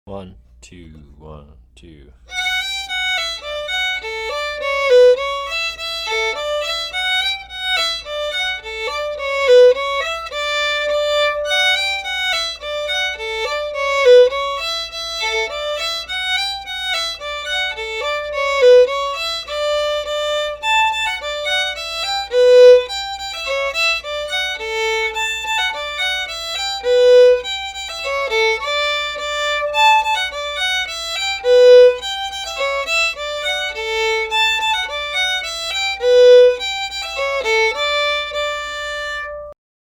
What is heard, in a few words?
barren rocks of aden polka fast